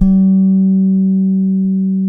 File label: SAMBA, Bass